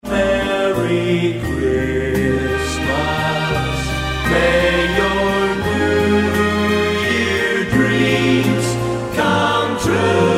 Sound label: Christmas Waltz